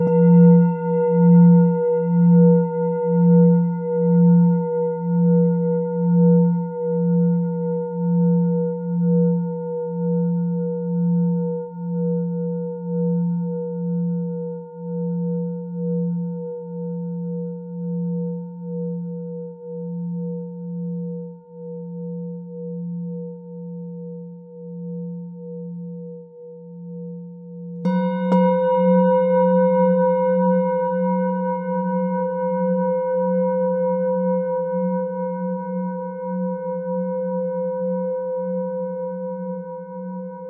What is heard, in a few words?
33 Bowls Set Strikes